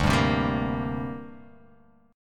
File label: D 7th Suspended 4th Sharp 5th, Guitar, Open F; strummed; JGuitar